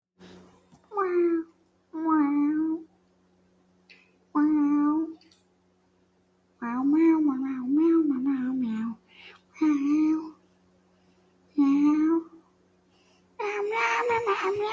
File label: cat singing